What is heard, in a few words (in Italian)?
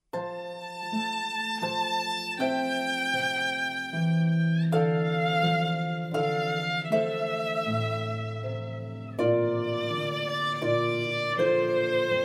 arpa violino; matrimoni